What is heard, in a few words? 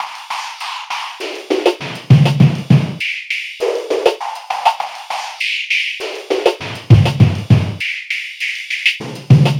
Wah Loop; Sonic Foundry (Sony Creative Software); Esoterik Beatz